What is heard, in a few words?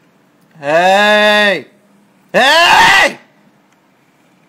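Funny owl; Hibou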